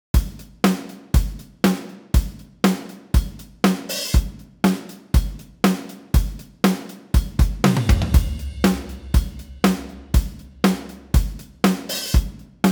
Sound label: drum kit